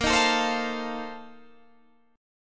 A sharp Major 7th Suspended 2nd Suspended 4th A sharp Guitar Standard; A#M7sus2sus4; strummed; JGuitar